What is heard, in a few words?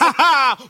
"ha ha", Voice; ooh ahh